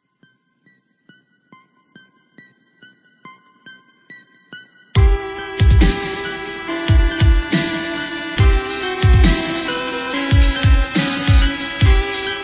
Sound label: dubstep remix; lavender town